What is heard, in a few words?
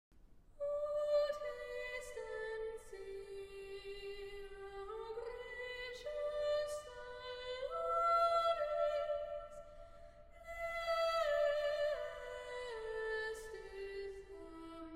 choir